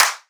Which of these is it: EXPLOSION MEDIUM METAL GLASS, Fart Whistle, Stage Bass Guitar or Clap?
Clap